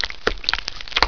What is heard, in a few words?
squelch